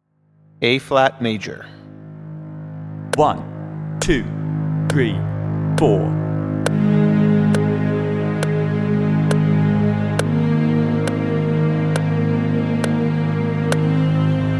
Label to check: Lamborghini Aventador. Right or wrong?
Wrong